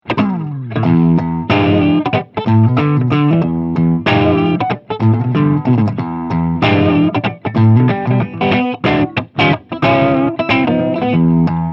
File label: Clean